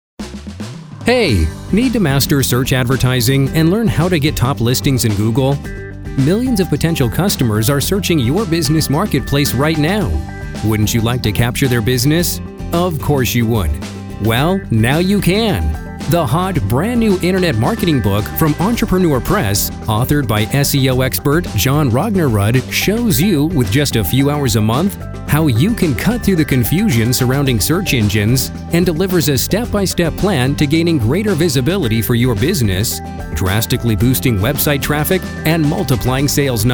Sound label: with music